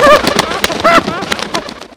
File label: Defeated Flying Away